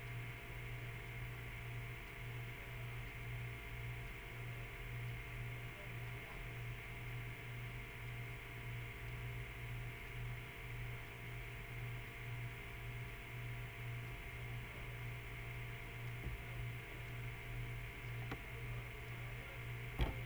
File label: backnoise; Ten2Zero